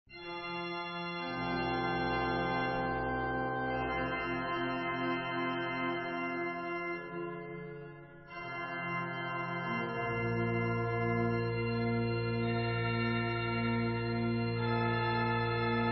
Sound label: Organ Music